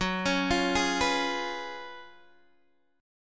Gb Major 7th Suspended 2nd Suspended 4th, down, Guitar, Open G